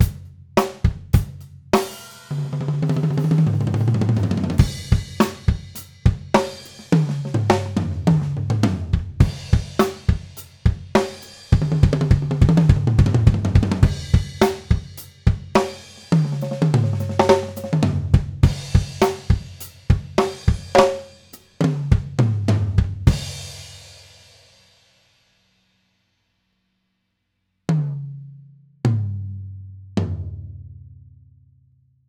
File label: Toms, drum; drum shootout